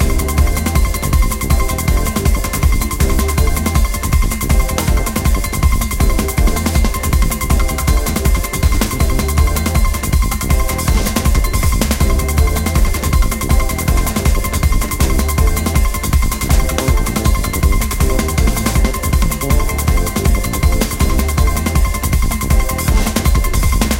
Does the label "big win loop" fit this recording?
Yes